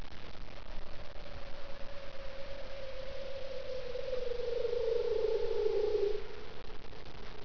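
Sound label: Leopard seal underwater FM Low Descending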